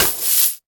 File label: Coins